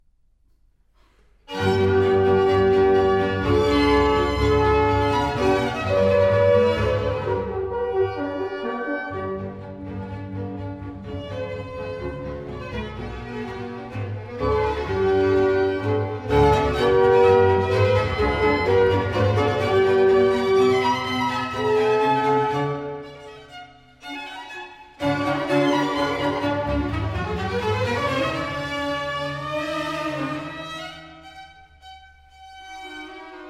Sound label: flute concerto in G major allegro maestoso